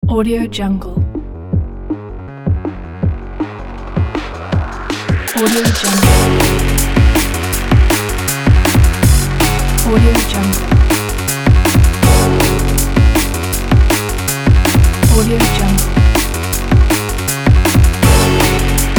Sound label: electronic action drive